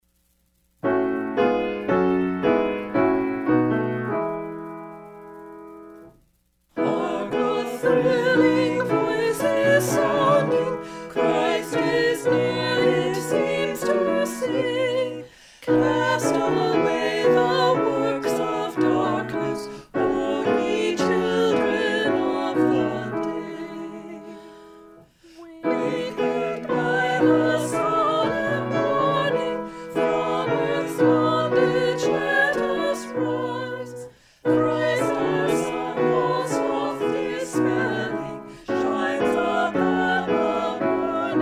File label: hymn SATB piano